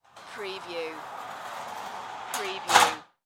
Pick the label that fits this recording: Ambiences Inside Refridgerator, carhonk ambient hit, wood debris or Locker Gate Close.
Locker Gate Close